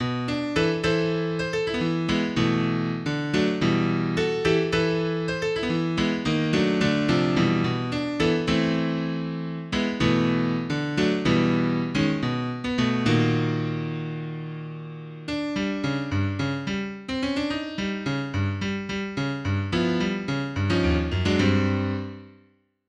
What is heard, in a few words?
piano